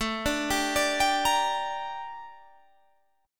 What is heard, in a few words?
G Minor A, chord; Gm/A arpeggiated